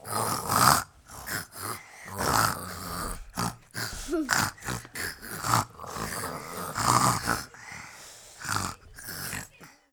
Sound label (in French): cochon